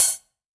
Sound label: HH, Drum Mashines; EdgeSounds